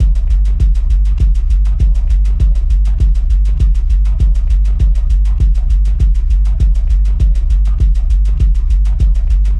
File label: techno, Music; ゆめ2っき